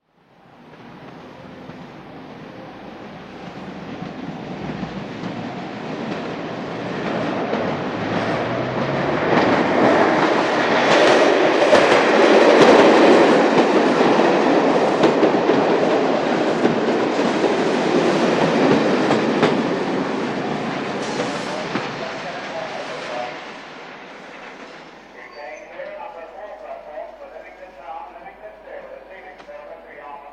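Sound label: Electric Train In Exterior Ambience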